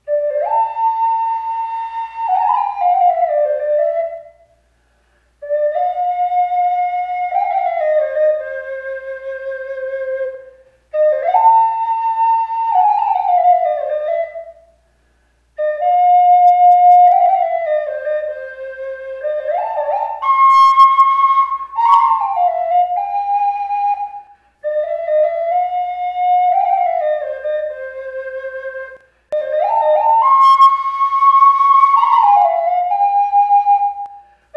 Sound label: Tonette Improv; Coyote